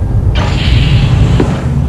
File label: Door Open; trek